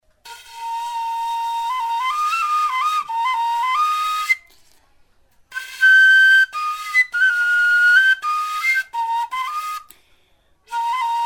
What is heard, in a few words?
Meuneu flute, notch flute solo; Lahu